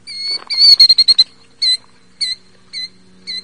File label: Killdeer Bird Call